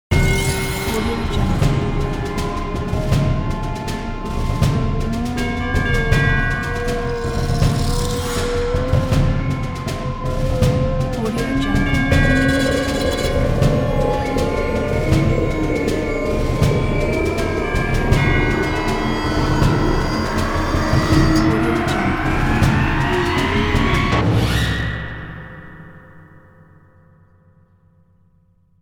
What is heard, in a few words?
horror movie logo pack; kipaloops